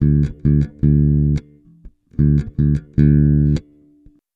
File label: 110 Pop Basic Bass D, E-Bass; Pop Rock